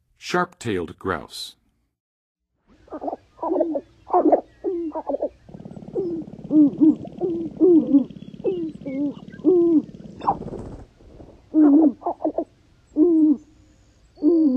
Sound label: Sharp-tailed Grouse, Birds of North America; Western USA; Western USA Songbirds